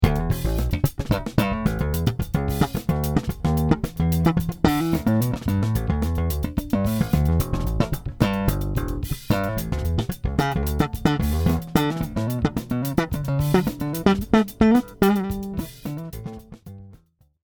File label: sb500s slap sample